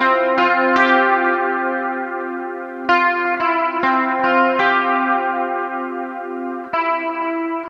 Dream Guit

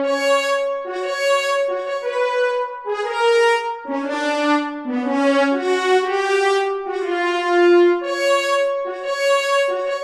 French Horns

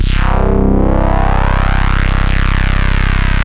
Cutting; Звук синтезатора: Cutting (Hardstyle Synth)

